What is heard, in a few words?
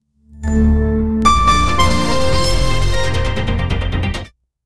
cinematic fast paced intr